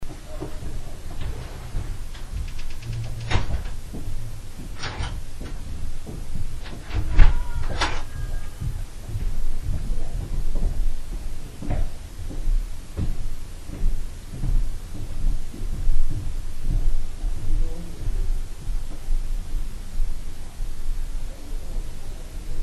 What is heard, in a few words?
screams amplified; attic; The file has been amplified.; The following clips were obtained from Burn Brae Mansion.